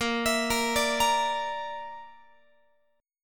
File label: A sharp Major Flat 5th, Guitar; A#Mb5; arpeggiated; x,18,19,20,18,21